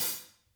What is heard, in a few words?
hat, Vintage Timetraveler kits